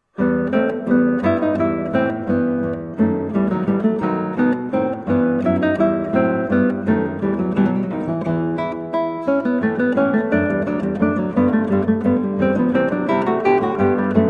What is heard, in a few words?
Rondeau from Trio Sonata in E Minor, Guitar; HWV398; George Frideric Handel